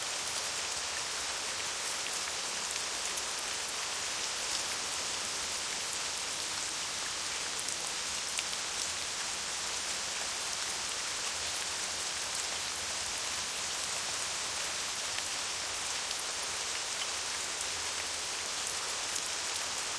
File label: Rain Light Loop